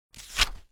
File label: page flip